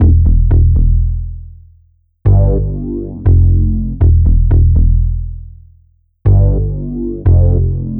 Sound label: Synth Bass